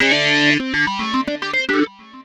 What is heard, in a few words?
Funky Lick, Keyboards